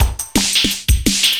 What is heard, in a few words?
loop, 170 BPM, drum & bass